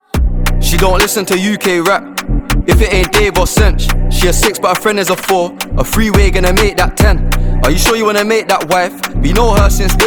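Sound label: British rap ringtone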